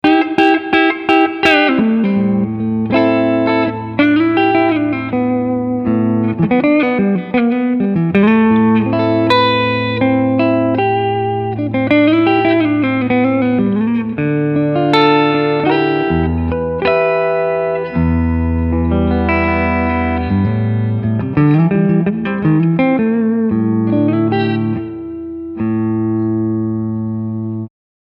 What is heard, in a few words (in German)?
lag roxane racing 1500 halspickup cleansound